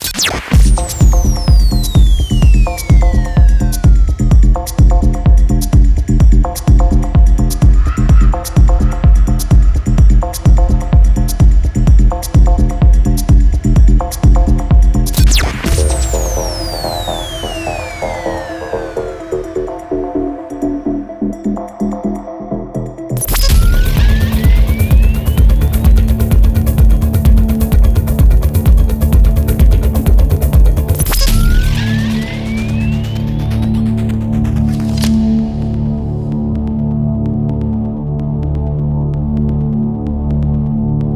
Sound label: HOUSE n TECHNO; VERA ON TRACK